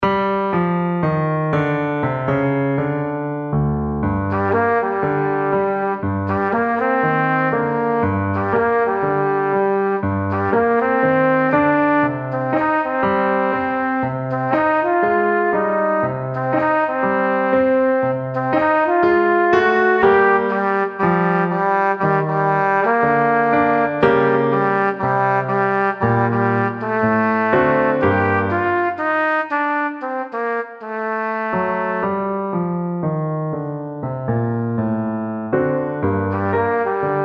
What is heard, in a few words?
Cool Cat with trumpet